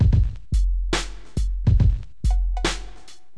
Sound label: drum